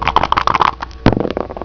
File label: Long Dice Sound; David's BackGammon